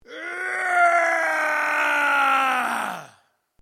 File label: MALE ROAR AGGRESSIVE LONG; Yelling; Voice